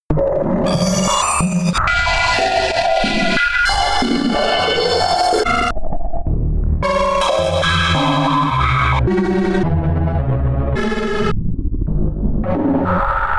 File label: atmosphere extreme erratic craziness low, digital; atmosphaeren umwelt